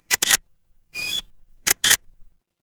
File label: office camera